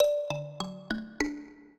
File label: minuet, mbira; Mozart